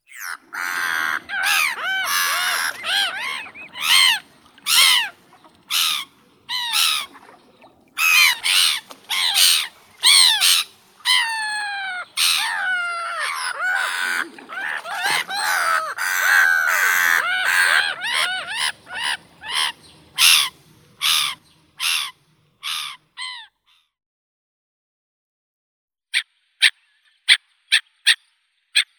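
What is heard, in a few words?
black-headed gull larus ridibundus; chaika ozernaya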